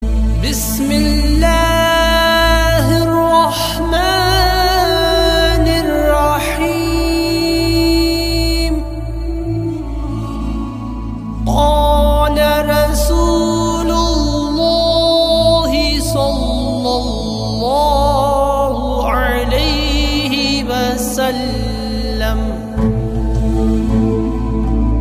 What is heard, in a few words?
Bismillah Muslim Song Ringtone